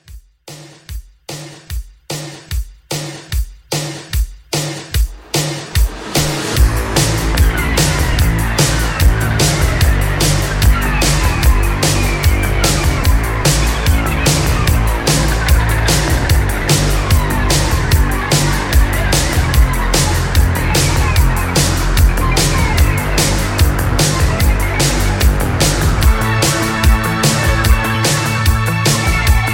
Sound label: 80s Rock Re Drum 148